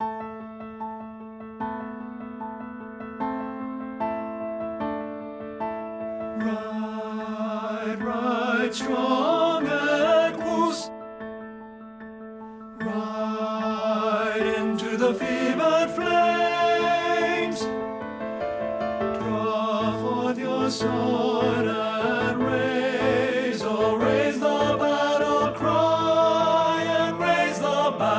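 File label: CRY HAVOC TENOR; men; partbypart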